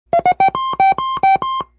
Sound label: Sting; noisetoys